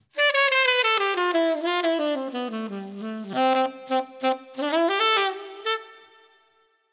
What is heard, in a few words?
12beat Dmaj 90